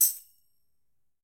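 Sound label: Tamborim C Shake; Latin Percussion; Drums; Drum Hits; Tambourin; Ableton; Factory Packs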